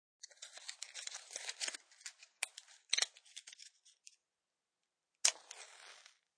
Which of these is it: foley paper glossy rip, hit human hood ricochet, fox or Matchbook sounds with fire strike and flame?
Matchbook sounds with fire strike and flame